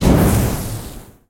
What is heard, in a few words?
fire Throw; willyshotchillies